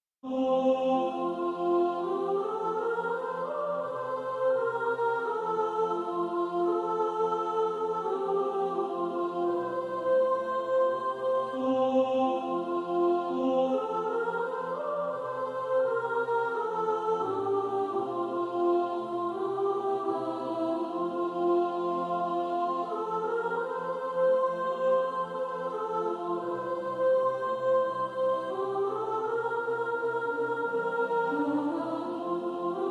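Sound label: soprano, practice music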